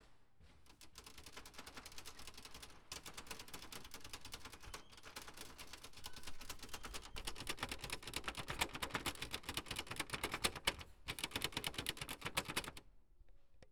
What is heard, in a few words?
Furious Typing Keyboard; West Hall Tech Dump